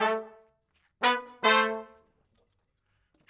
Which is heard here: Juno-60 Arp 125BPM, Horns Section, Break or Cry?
Horns Section